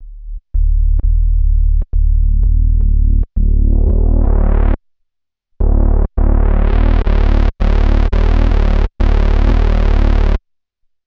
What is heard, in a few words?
Grow Bass, Drum n Bass; KJ Sawka; Drum n Bass & Beyond